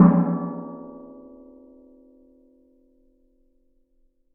Strike, Washing Machine Drum Outside

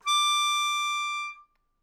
Melodica Long, Long Notes Chromatic

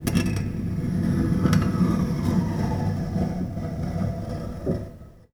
String Scrape, Piano; Piano Miscellaneous

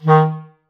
Clarinet

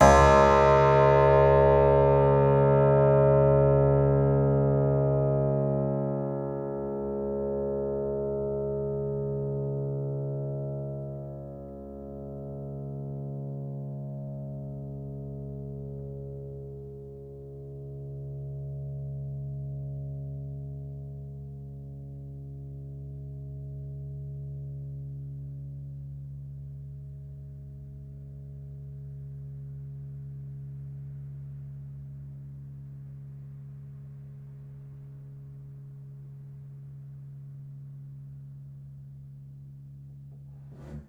String Pluck Ped, piano; Piano Miscellaneous

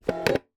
Single Shunt, DVD Drive External

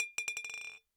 Bottle Struck

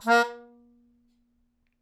Melodica Short; Short Notes Chromatic

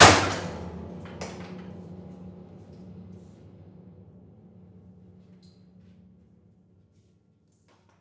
Key Smash, Piano Destruction

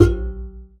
Classical Guitar Extended Technique

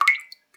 Drips

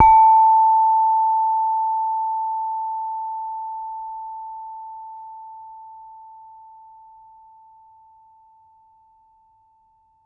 Saron B, Gamelan

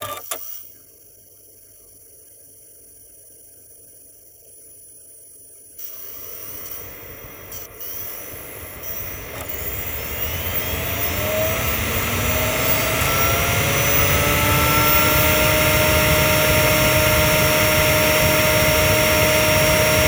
Spin Up, DVD Drive Internal

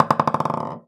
Golf Ball Bounce; Balls

